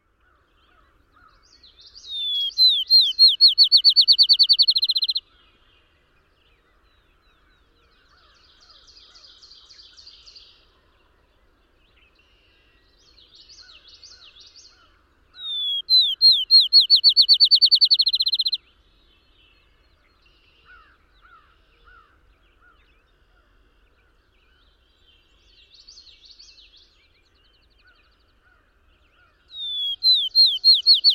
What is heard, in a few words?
field sparrow regular songs